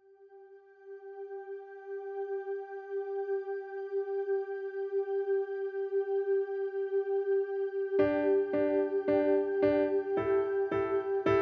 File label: Instrumental; Kaleidoscope Theatre